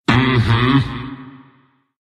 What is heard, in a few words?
move pain, ru; dota2